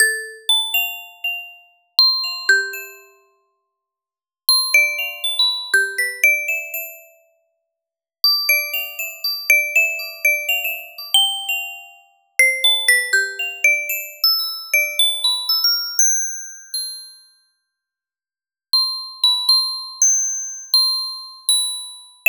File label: G 120 Glock